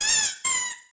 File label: squeak duck; World of Tanks Blitz; ambient milbase